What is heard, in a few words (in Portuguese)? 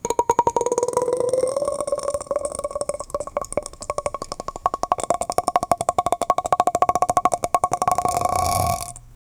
um simples plop vocal, bubble